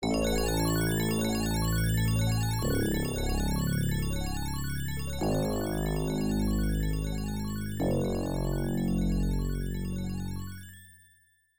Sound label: Continue; Vindicators